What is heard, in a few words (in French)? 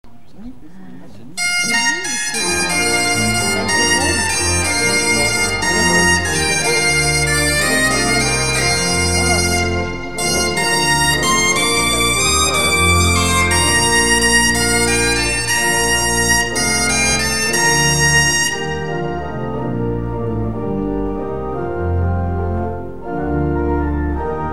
Orgues et Bombarde